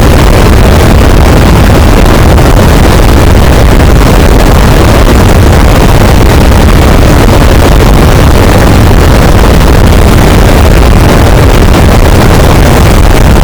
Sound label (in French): cachalot negatif, boat, Physeter Macrocephalus